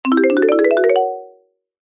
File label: multimedia alert notification musical short marimba positive feedback; zapsplat